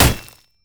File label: basic attack; olaf